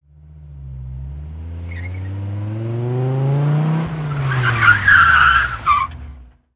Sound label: Accelerate Quickly, sports car